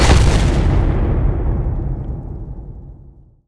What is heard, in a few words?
slam small; weapons; arcana; dota; earthshaker